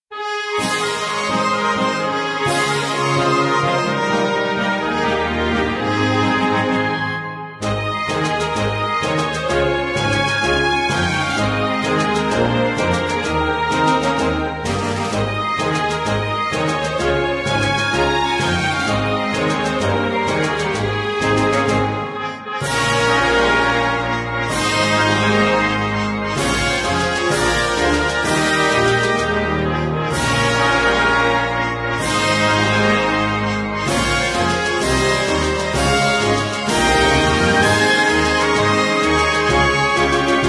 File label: bgm